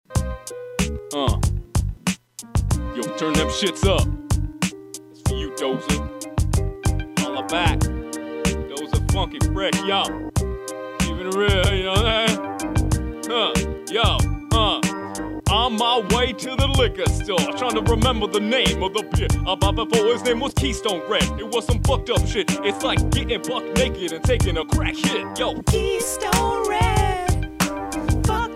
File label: hip hop